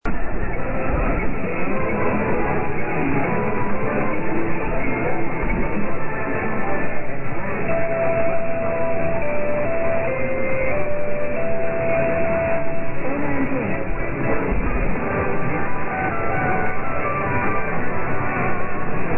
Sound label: 6 note jingle; 740 Radio Angulo Cuba 0600 UTC